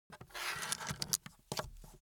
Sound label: foley office pencil pickup; smartsoundfx